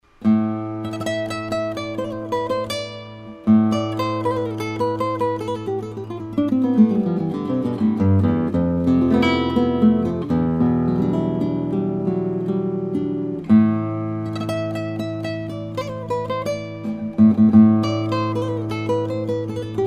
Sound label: classical guitar; nylon-string guitars; haight